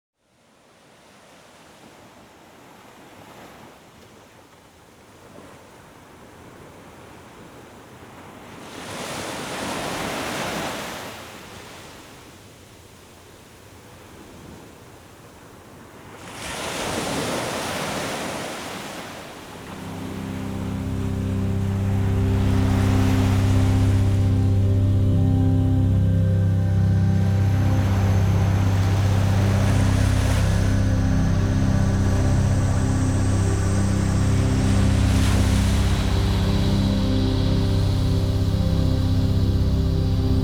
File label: Relaxation Music with Ocean; relaxation music of Serenity and the Ocean; Deep Healing